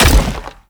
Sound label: Step Default, Foley; Warframe; Sentients; Erra